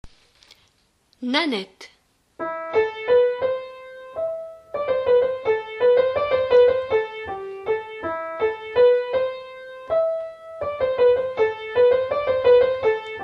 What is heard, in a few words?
nanette, basses